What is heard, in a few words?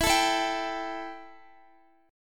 E Minor Double Flat 5th E Guitar; Embb5; strummed; x,x,16,14,16,15